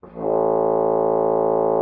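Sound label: French horn A3; Xsample VOL-3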